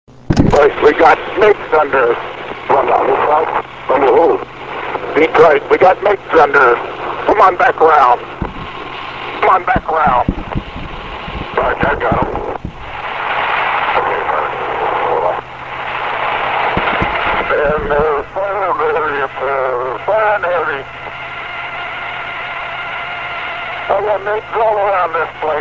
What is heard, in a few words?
dogfight